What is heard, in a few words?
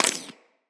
Bullet Impact Ricochet